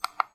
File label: Fail Hit